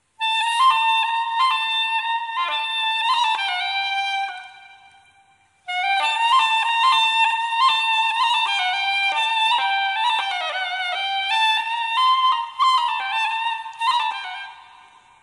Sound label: Solo Ney